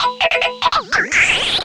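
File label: guitar loop, 145 BPM, drum & bass; Ueberschall; Drum & Bass Flash Trackz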